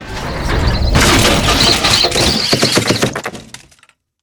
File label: pod, ships; CosmicRage; Ratika